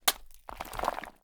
BIG CHISEL HIT DEBRIS, Ice; FLYSOUND; Ice Fury

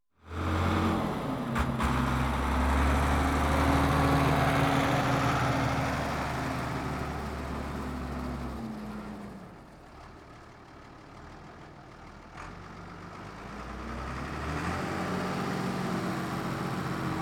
Tractor Ford 7810 Tractor Movi; Farm Machinery; BBC Sound Effects Library

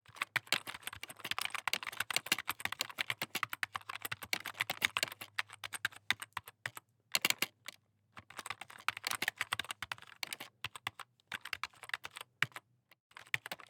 Computer Keyboard Type; Soundopolis; Foley Plus; Fienup

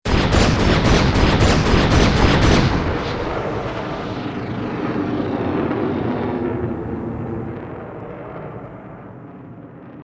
Bullet Time; FXHome; Bullet and Gun

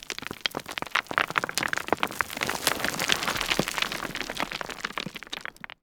rockslide; Foley Elements Rocks, Dirt And Stone

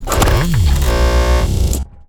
mech language expression, robotic; Bluezone; Assault Mech Robotic War Unit

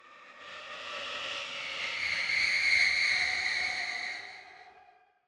the spirits awaken again, horror; 2496sfx; TheHorror

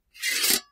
plates Slide; Kenney's Sound Pack; Foley Sounds; Plating

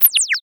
minimize, Interface Sounds; Kenney's Sound Pack

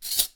Cutlery, Fumble, Heavy; Kitchen; benlustedaudio

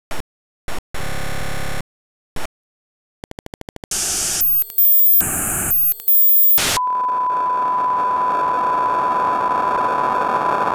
Glitched Loop, Digital Glitch; Total Khéops

